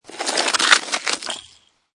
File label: Snow And Ice Ice And Snow Grate With Ice Particles; Ice Sound Effects